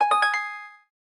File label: harp star, keytone; SKY IM-A760S-VEGA-Racer